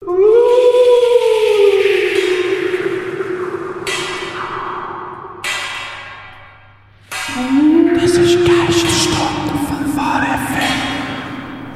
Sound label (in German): Jingle; Geisterstund